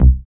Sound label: BASS STER.